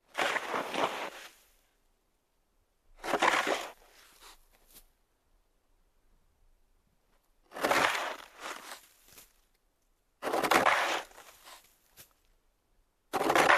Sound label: Shoveling through dirt and sand